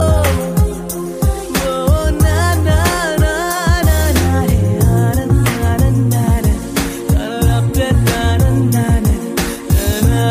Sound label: Beat